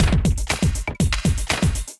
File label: Kit, Drums; Kindified Kits